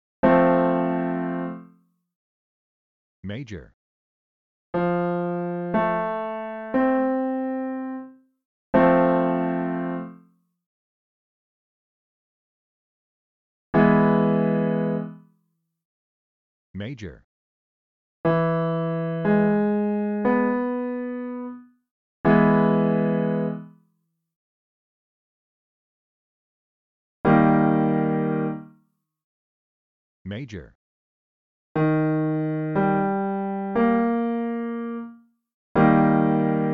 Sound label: Major and Minor triads root